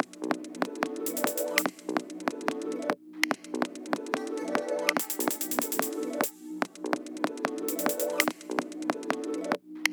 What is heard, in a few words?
Harp sampling